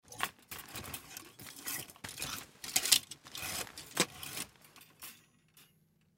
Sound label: Closet Dig Through, Closet Dig Through Cloths Hanger Movement; Closet Doors; Household; Blastwave